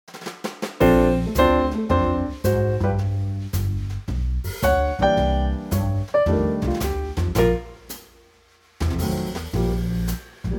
Bb Trio

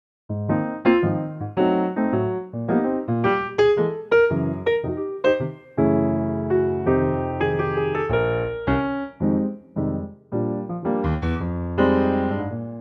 Eb Piano

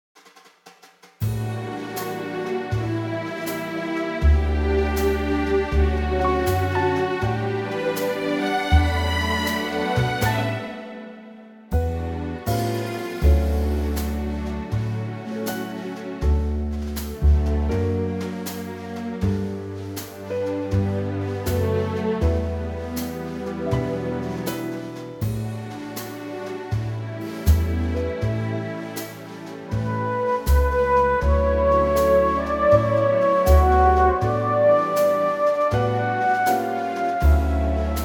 orchestral

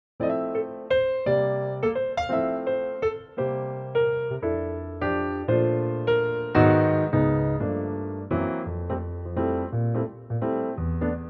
3/4 F piano

